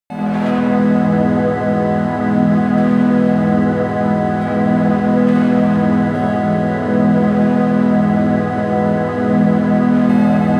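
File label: chrystal air, pads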